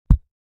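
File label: sounds of tapping a finger on a microphone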